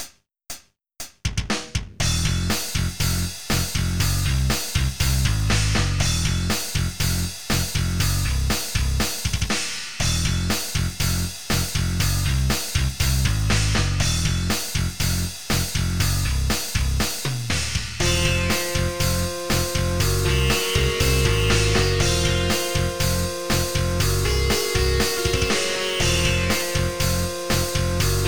Sam's band (heavy version); stardew valley ost

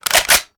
reload drum empty charge; viper

